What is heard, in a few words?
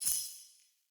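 Staff Caster Projectile Destroy; Weapons; Player; Staves; Starter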